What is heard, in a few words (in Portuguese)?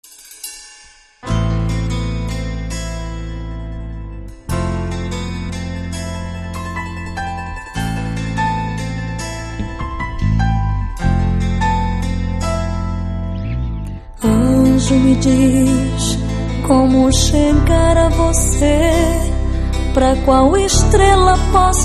banda acustico